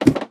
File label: ladder, step; minecraft pe 0.6.1